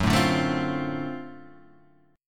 D 7th Sharp 9th F sharp

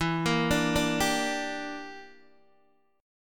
E Minor 7th Flat 5th E, Guitar Open D

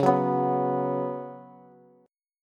Db 7th Suspended 4th Db down Bass; JGuitar

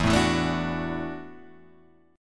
E 9th Suspended 4th E down; E9sus4 strummed; JGuitar

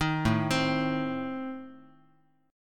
A Diminished A, Guitar; Adim arpeggiated; JGuitar

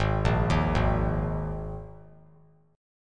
Ab Suspended 2nd; arpeggiated; JGuitar

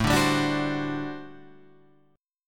G Major 7th Suspended 2nd Suspended 4th A

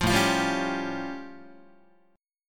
A Augmented 7th A Guitar; strummed; JGuitar

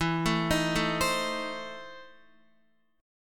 E Major 7th Suspended 4th Sharp 5th, Guitar; EM7sus4#5 arpeggiated

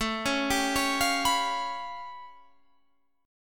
A Augmented 9th A, Guitar Standard; arpeggiated; JGuitar

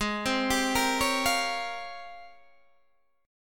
G sharp Major 13th, Guitar, DADGAD

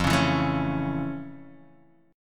F Augmented Major 7th, guitar chord; F+M7 Chord {1 4 2 2 2 X} strummed

